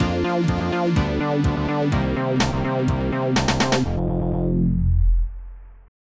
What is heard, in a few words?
lose; F1 Licenseware - Volume 1; Win & Lose